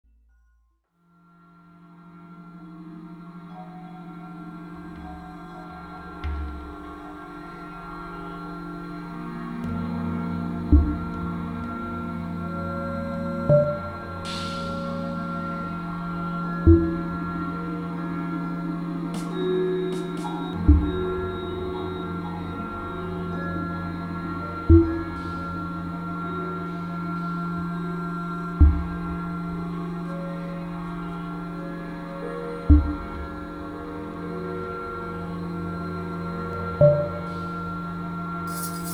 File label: popcorn bird feeder